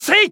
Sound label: kiai; MonsterHunter; Fight